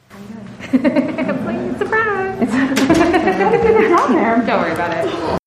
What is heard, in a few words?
laughing